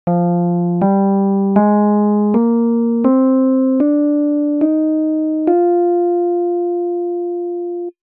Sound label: F Dorian scale